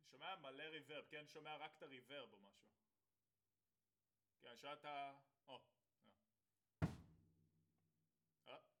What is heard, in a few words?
Ride